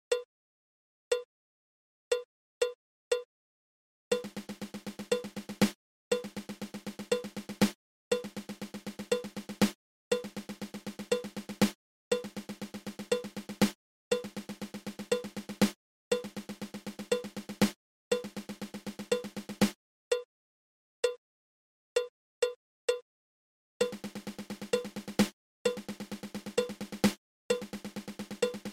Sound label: Thirteen Stroke Roll